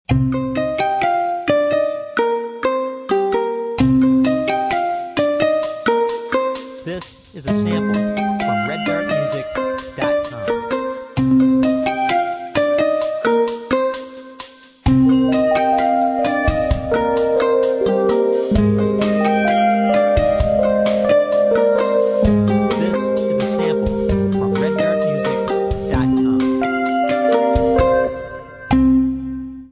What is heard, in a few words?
Kalimba